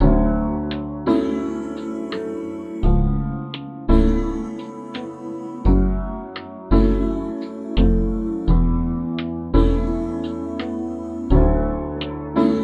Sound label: Gospel - 85 BPM A Maj; Compositions; Cymatics; Zodiac Vol 2 Beta Pack; Zodiac Vol 2 Premium Compositions